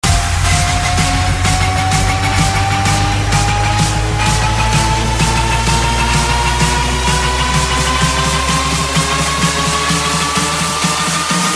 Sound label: Small Room House